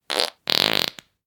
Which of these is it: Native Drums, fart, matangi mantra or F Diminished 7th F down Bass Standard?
fart